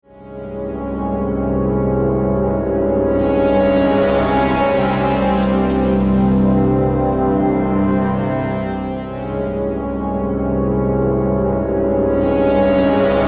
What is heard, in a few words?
Cinematic Production Company Logo Opener